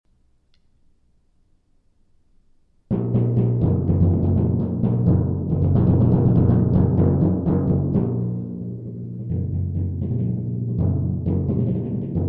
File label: Timpani etude; 8th grade all state